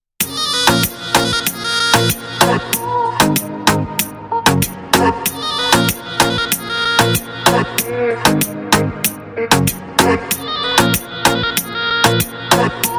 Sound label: habibi dj ringtone